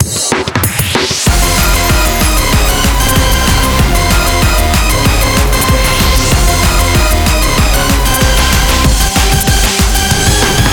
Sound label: BGM Reach